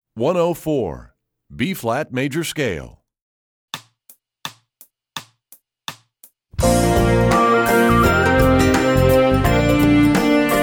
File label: B flat Major Scale, Strings; EE2000 Book2